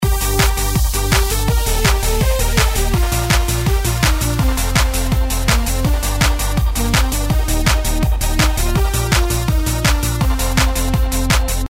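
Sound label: party girl intro background music; Party Girl Ways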